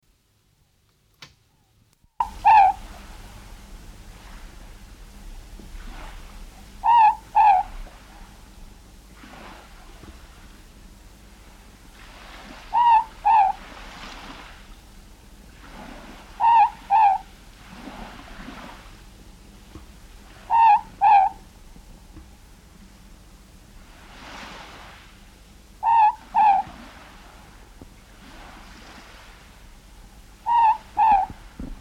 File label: morepork song; conservation, native animals, birds, bird song